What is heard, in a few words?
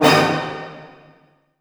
A MAJ, ORCH HITS; Sound On Sound; Peter Siedleczek's Orchestra